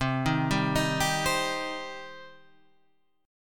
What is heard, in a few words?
C Minor C; Cm Chord {8 6 5 8 8 8} arpeggiated